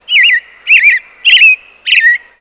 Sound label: robin